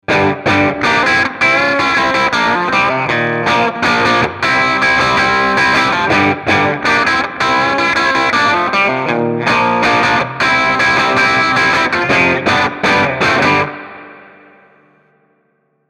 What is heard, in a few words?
MF Boost Boost on